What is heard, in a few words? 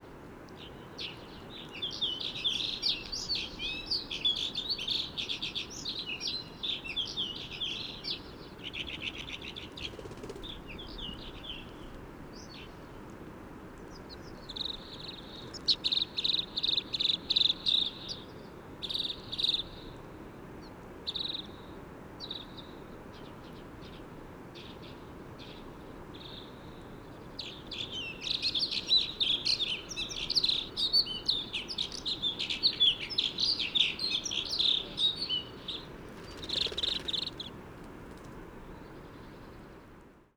song calls